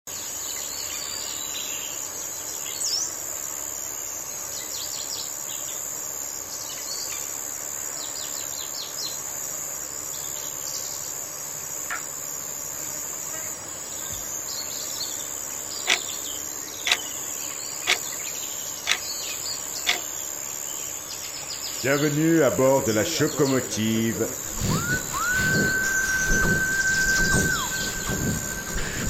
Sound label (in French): SANS VOIX